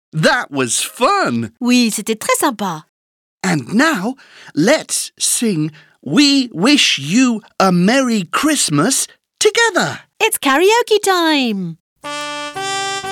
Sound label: song we wish you a merry Christmas karaoke